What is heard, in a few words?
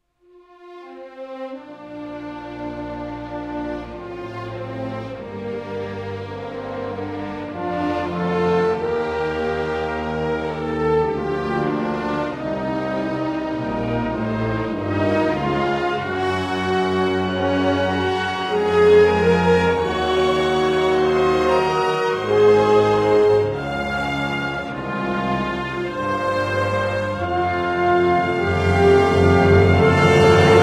victory; War Thunder